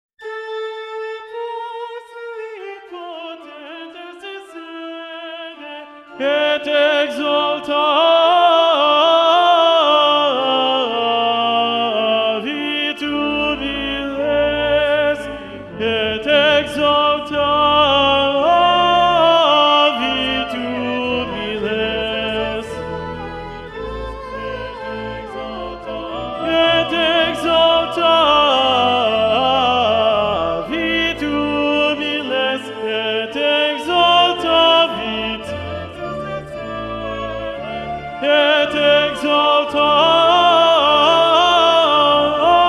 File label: Tenor Predominant; Durante; Deposuit potentes (Magnificat)